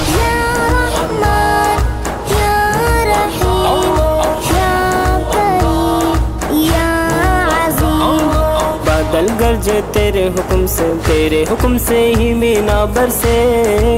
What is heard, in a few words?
Hamd Urdu Ringtone Islamic